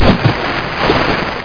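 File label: big splash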